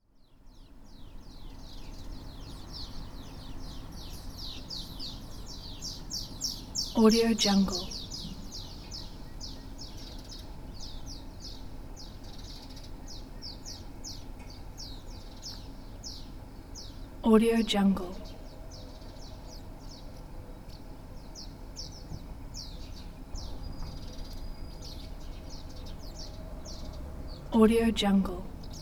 birds chirping noon ambience; panoramathesoundoffilm

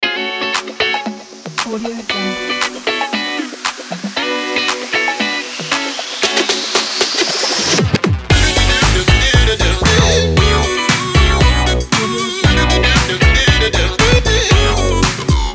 electro funk

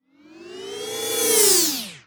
pewhoosh

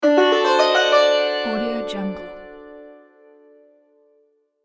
indian sitar

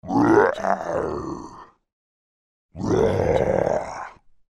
orc grunt

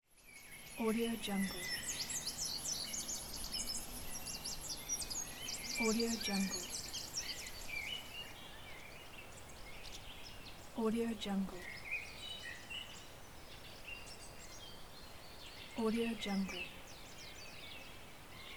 nature sound

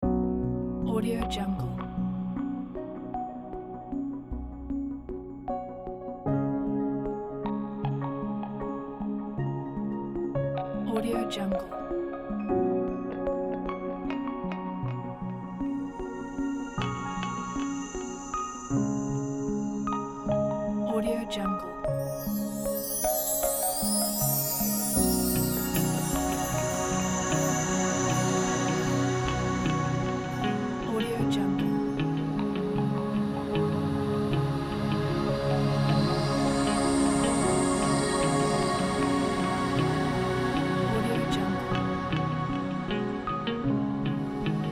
nature documentary

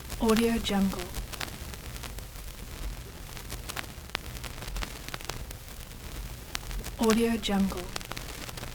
vinyl crackling

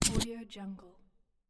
game interface sound; stormwaveaudio

